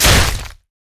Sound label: basicattack dragon; LoL; shyvana